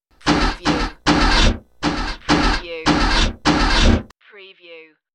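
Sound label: Car Not Turning Over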